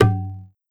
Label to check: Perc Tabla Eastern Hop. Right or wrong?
Right